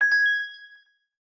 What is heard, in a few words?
crystal unlock; LG G Flex